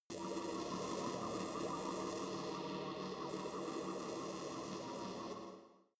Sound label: space inside biomedical alien shuttle buzzing energy